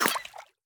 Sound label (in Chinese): 美西螈攻击声